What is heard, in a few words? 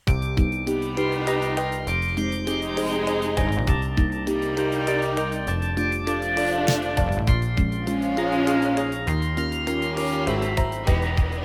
BGM graveyard ruin; Town of Night